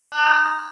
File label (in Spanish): Grito niño